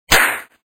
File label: slap